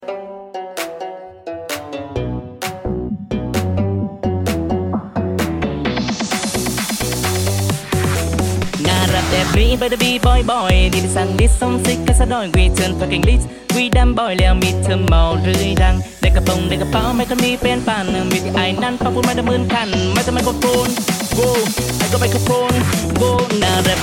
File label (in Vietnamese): Nhac Chuong Cover